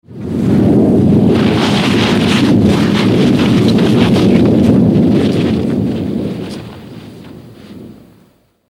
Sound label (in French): Avalanche, bruitage, Ski